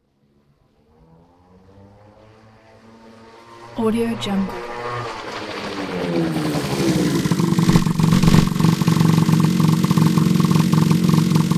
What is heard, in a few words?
scooter pass by and stop